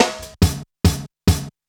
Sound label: LOOP SD, Drum & Bass, 140 BPM